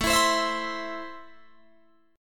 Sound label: A Minor, Guitar, Open D